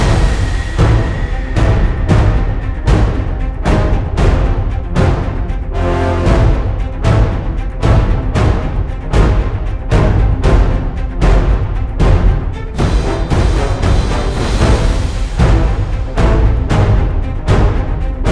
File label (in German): Spannung Loop